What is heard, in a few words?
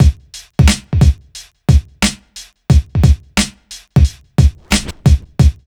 BEAT, Burning Grunge Hip Hop; HHFX 89